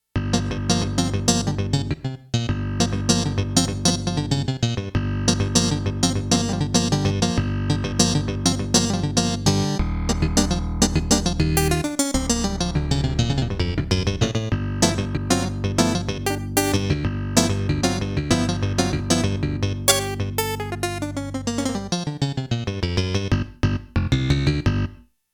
SYN CLAV, Roland JX-1; Factory presets

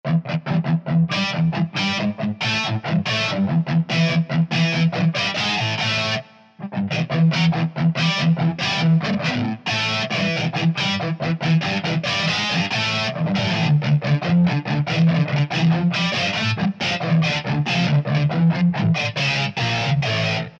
Touchy Mutes; Roland SRX-07 Ultimate Keys

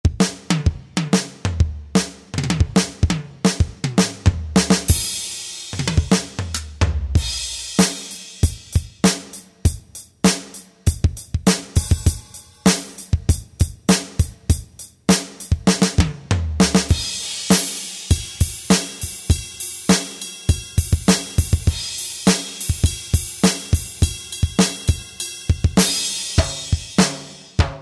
Real Drums, Kurzweil K2000; Kurzweil K2000 V3

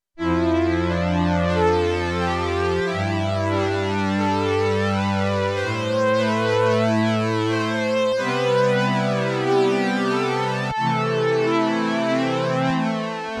PANNING STRINGS, SIEL DK 80; Factory

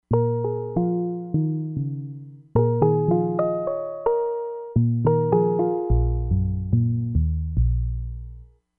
MALLET HARMONIC, Crumar Bit 99; Factory Programs

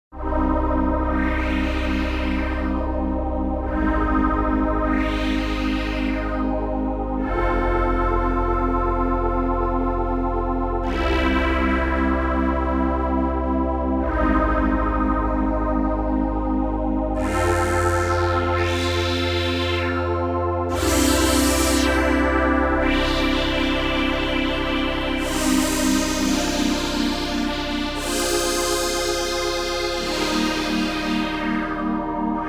Spacios Sweep, Roland JV-880; Factory Patches

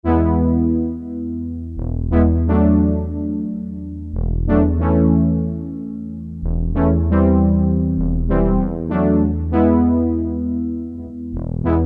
Brass, Korg DSS-1 DWGS synthesizer; Korg DSS-1 Sound Library

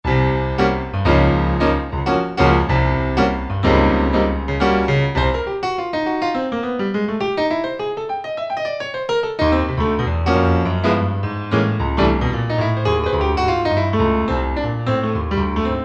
Jazz Piano, Alesis ZA Stereo Jazz Piano

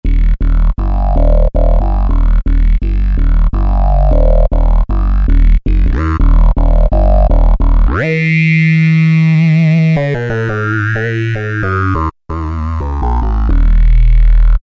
VocoBass; Roland SR-JV80-11 Techno Collection

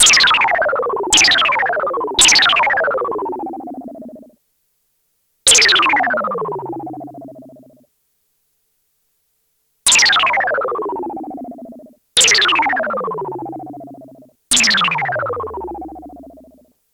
SHOOTING STAR, Korg DW-8000; Factory Patches

